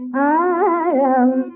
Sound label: Pantuvarali Shruti E